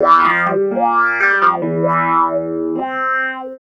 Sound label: Clavinet; Ambient Grooves